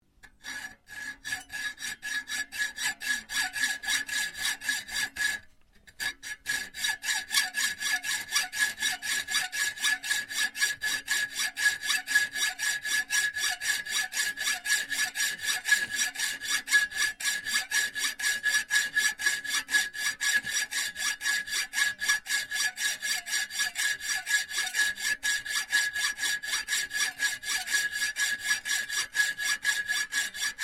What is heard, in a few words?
Hacksaw saw slow, domestic hacksaw; Machines